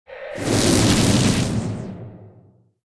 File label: Dark; New The Denpa Men